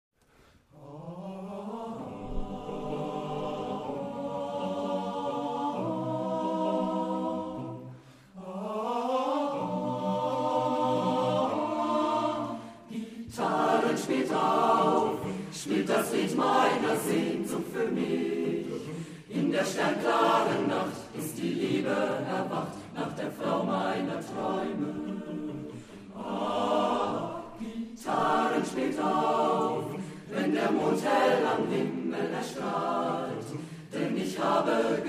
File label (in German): gitarren spielt auf